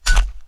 flesh impact; 0ad; attack impact

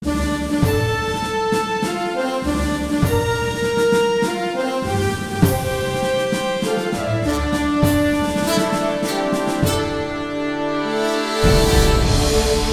win, music; 0ad

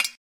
arrow impact metal; attack impact; 0ad

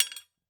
sling impact metal; attack impact; 0ad

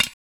arrow impact metal; attack impact; 0ad

